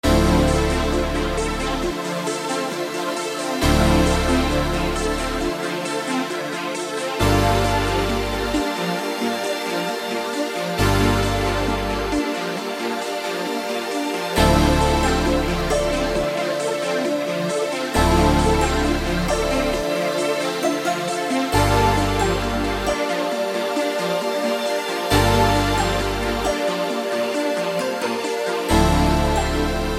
134bpm D min

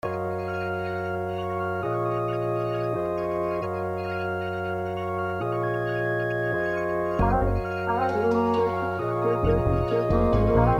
Voxxi 134 Bb minor pop melodic

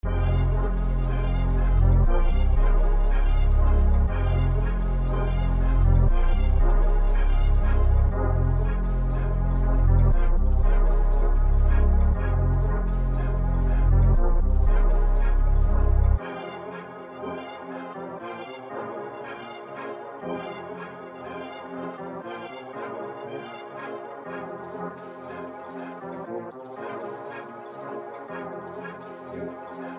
No Quarter 119 F minor dark

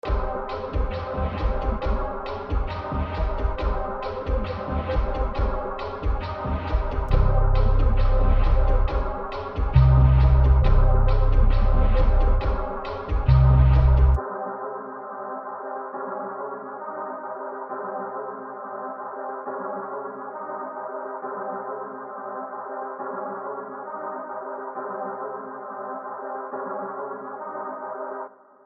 Hades 136 C minor hard

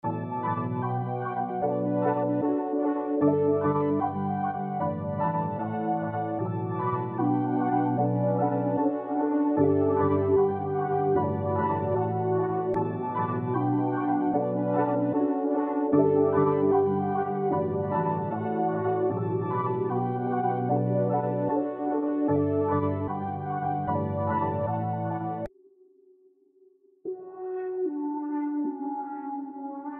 Kingdom 151 D major melodic

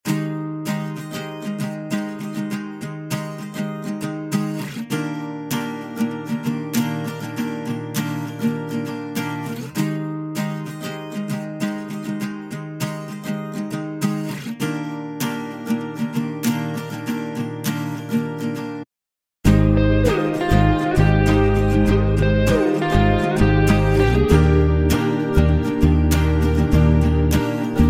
99bpm D maj

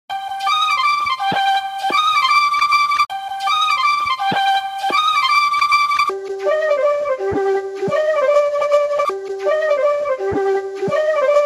160bpm G minor

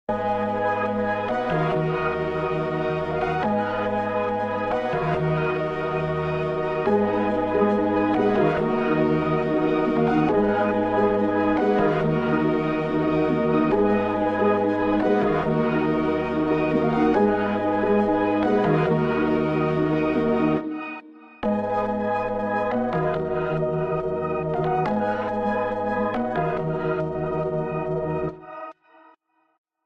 Furnace 140 G minor hard

